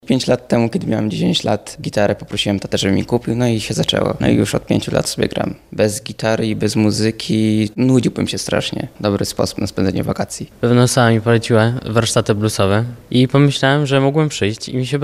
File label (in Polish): wakacyjny blues; w pulawach